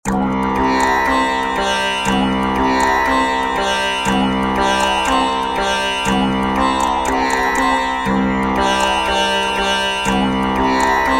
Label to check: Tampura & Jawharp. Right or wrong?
Right